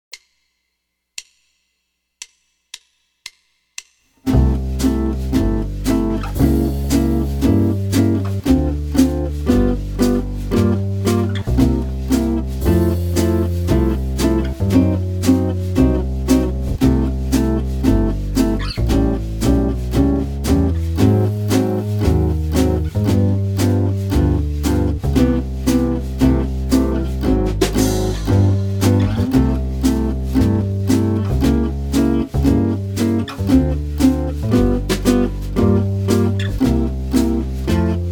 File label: Min7b5 Dim7 Sub, jazz guitar